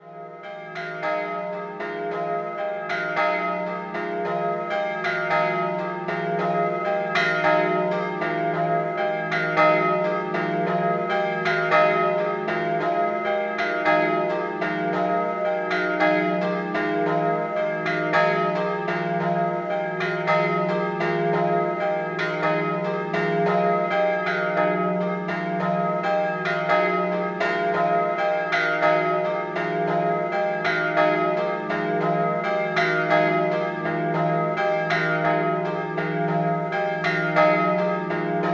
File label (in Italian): campane